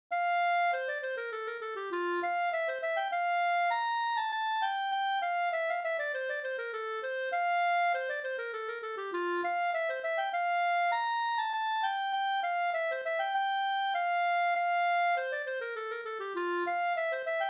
slangpolska

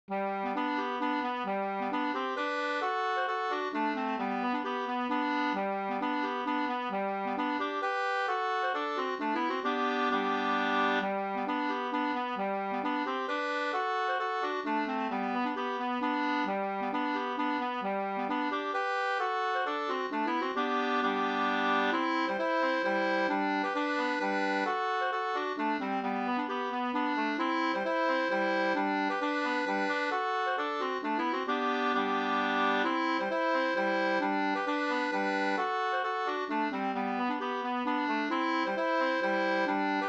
hambopolska; fr Varnhem nr1 av H Falk

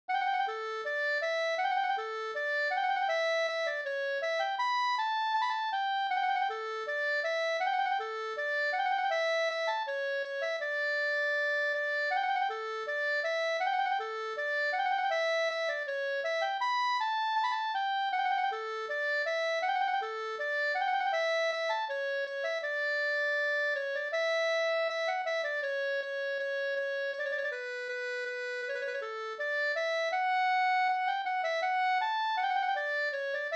skanklat

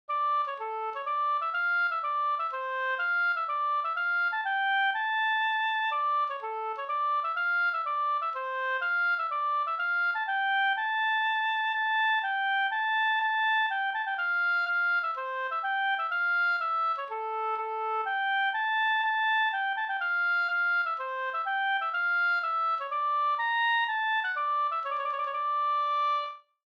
polska Bodapolska

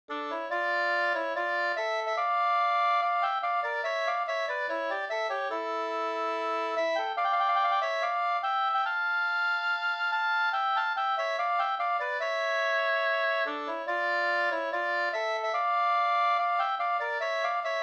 skanklat

